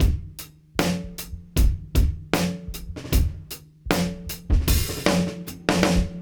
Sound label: Beat Loops, 077; Zero-G; Monster Beats